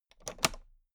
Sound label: toolbox latch close regular